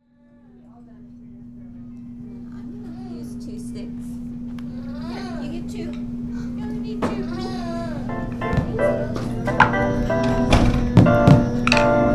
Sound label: Orba Drums Harp